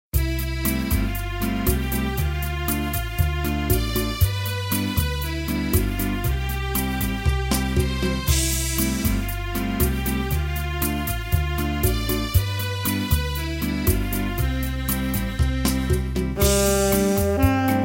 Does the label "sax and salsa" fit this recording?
Yes